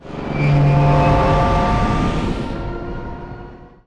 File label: boost; gmod; lvs star wars; laat